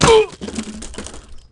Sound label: fail; Matt Hoffman's Pro BMX 2; XBOX